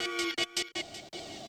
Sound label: Industrial 160